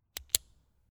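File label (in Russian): клик ручкой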